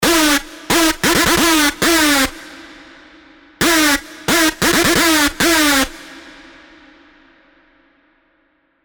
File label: Screech; alonso 18 year anniversary pack; Screech Kommando